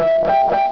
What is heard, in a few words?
bonus; Glypha v3.0ß